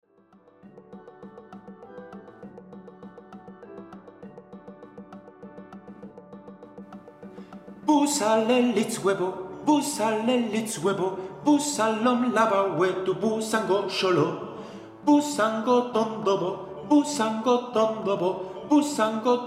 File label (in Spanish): soprano; REYLEON